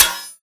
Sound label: bullet light ballistic blades deflection